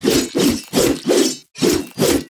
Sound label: ultimate attack; Characters; jamilah